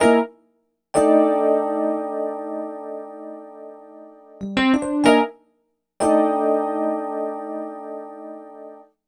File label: EP, Keyboards, Electric Pianos